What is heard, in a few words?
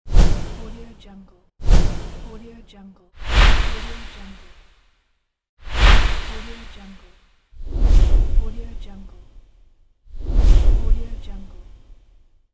whooshs; mega-loop